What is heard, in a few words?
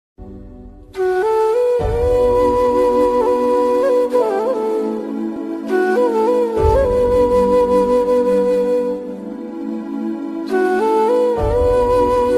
instrumental ringtone, flute ringtone; best flute instrumental